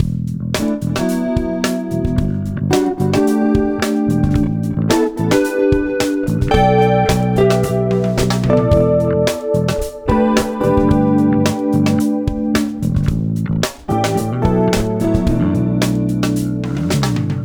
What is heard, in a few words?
intro to improv G loop